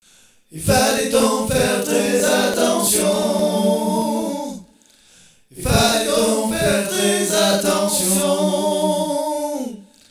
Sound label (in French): radar choeur attention